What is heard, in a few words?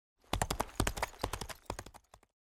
Horse; Age of Empires III